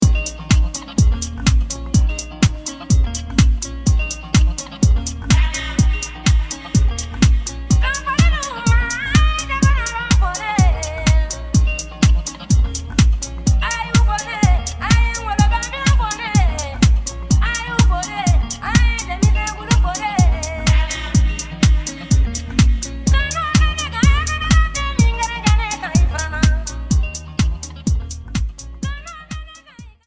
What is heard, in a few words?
Vocal